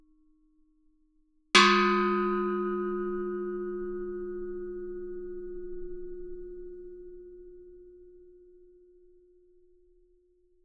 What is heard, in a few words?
Gamelan; Propeller Island - Complete Gamelan